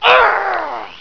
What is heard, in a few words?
grrr